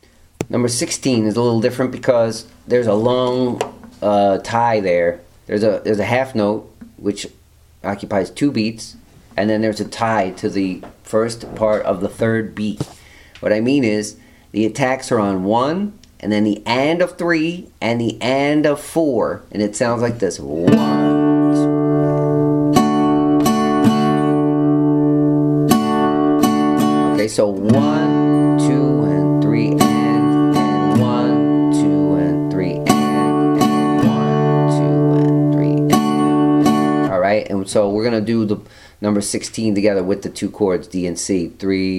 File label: strumming